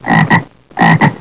idle, chumtoad; valve

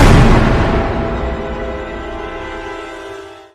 Jump Scare

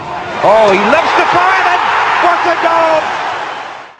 goal, soccer; valve; dutch

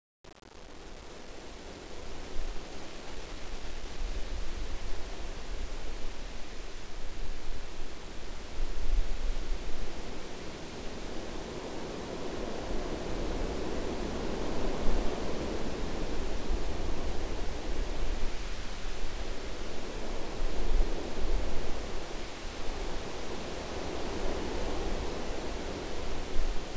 wind

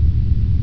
deep huum; valve; coil

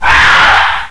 Alert; valve

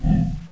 gorilla

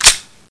slide forward, weapons; valve

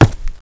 concrete; valve

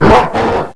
attack, gorilla; valve

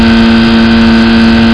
celica engine; valve

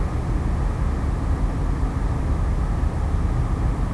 deep out; valve; coil

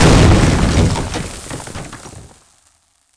concrete, debris; valve

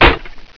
impact wood; valve

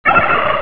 turk gobble; valve; turkeykillbox

